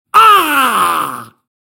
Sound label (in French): Perso cri de mort court; Blind Survivor